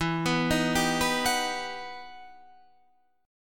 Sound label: E Minor Major 9th E